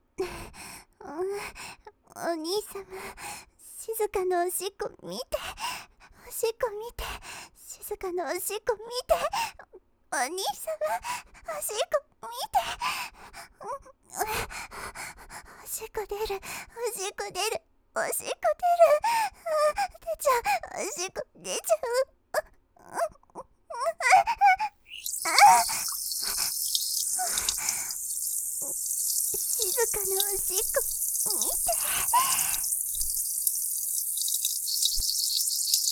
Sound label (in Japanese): ループおしっこ